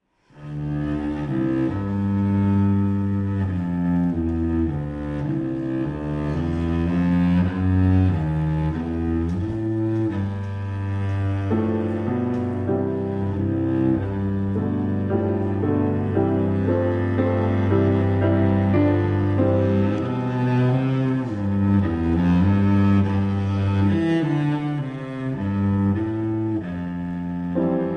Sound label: Sonate